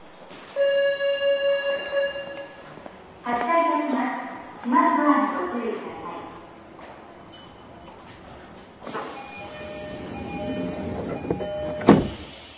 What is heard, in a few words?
vvvf, mitsubishi